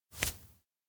forehead touch; fumiya; anemoi

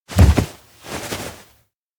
shoulder shake; aino

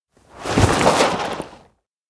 receive heavy coffee beans bag; anemoi

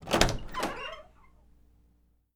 door open hospital room; anemoi

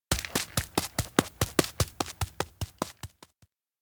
steps outdoor run; tsumire; anemoi

